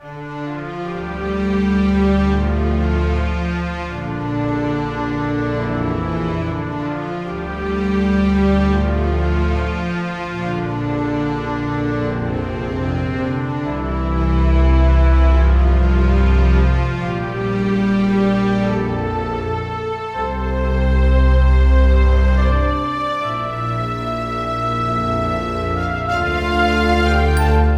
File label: Beast Mode